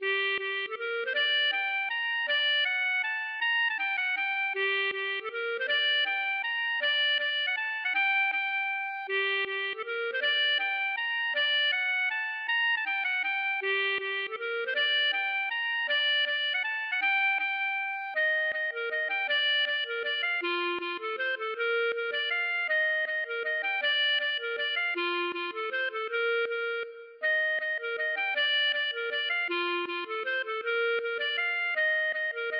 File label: mazurka